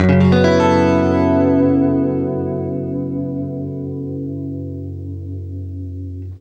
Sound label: CLEAN F#M9 R, Guitar; CLEAN MIN9TH; East West; Steve Stevens Guitar Samples Collection